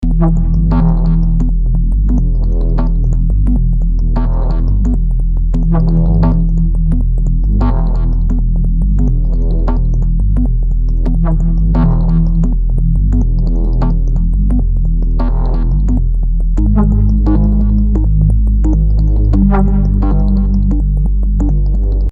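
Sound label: 174 bassloop, Xfer Serum; Tech Flavours; Drum & Bass Sample Pack inspired by the sub-genre of Tech Step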